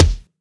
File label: kick dnb